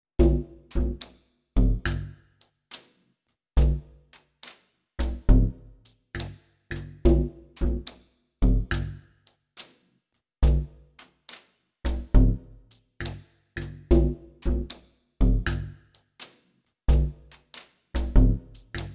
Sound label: Buffalo Drum; Buffalo Drum RMX